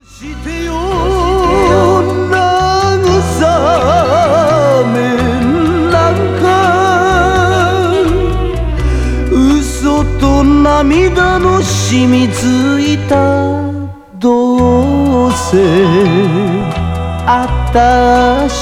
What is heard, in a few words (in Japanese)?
kayoukyoku